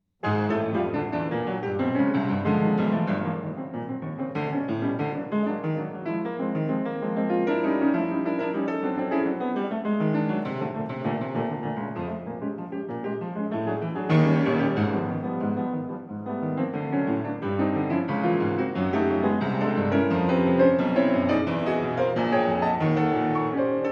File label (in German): Toccata fuer Klavier